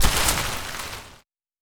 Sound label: Forest